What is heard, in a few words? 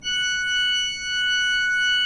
pipe organ, 3-D Audio